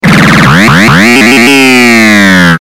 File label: Pinball Jackpott